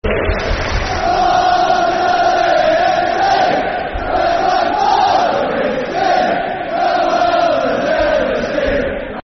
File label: Football Chants (Soccer Songs); Barnsley F.C.; Barnsley Bfc